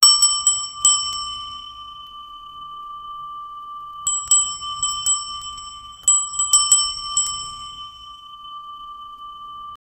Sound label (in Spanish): efecto de sonido de un, hotel